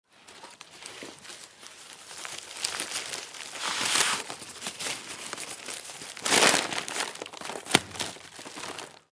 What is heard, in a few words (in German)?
Papiertuete aufblasen